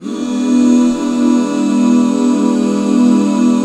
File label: E 7, back chords; Sound & Vision - Gigapack II CD 1